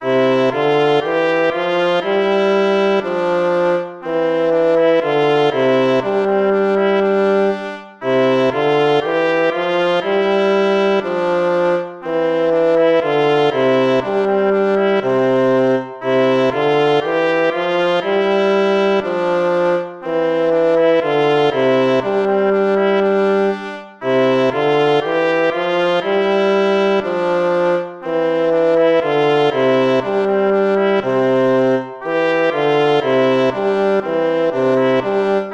gavotta; hook james

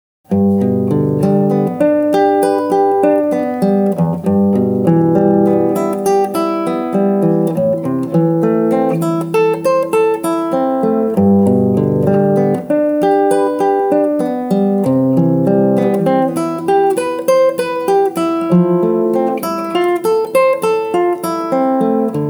etude arpeggio

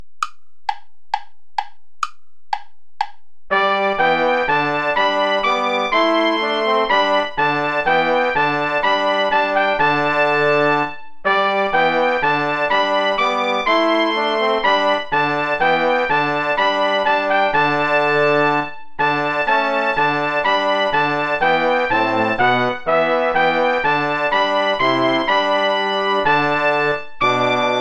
play along without trumpet; schutz heinrich wohl denen die wandeln

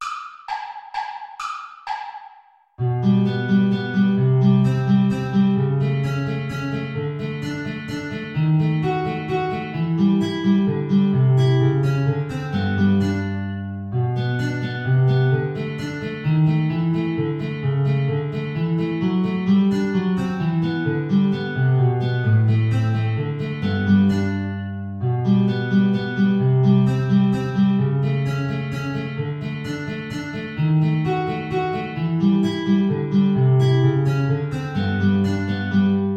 wish you merry christmas play along

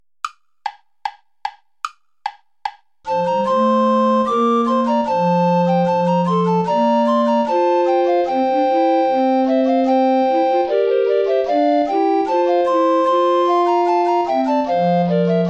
play along without tenor recorder; praetorius michael ballet des baccanales

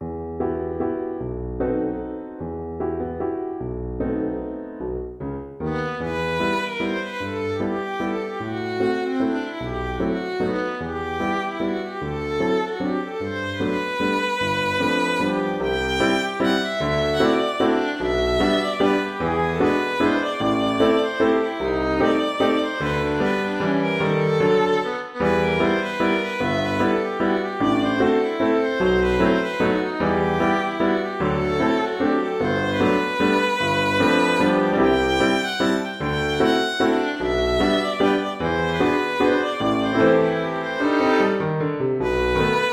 waltz for violin and piano; old russian

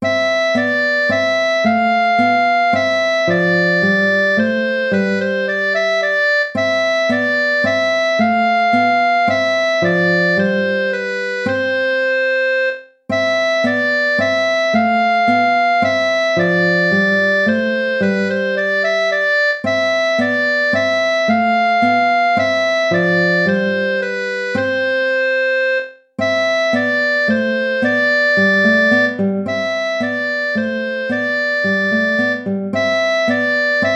tempo minuetto; hook james

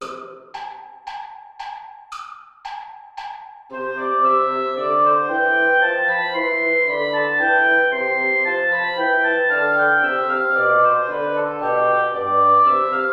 air play along without guitar

